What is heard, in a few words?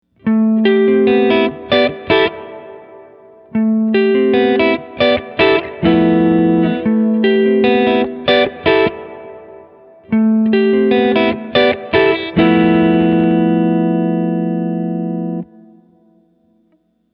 hall; bigsky; Between Walls